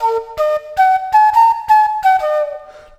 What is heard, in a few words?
flute, ethno; eJay